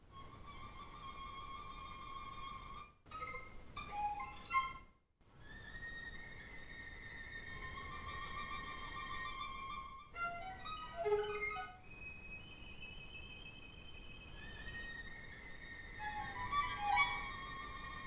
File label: Jingle Bell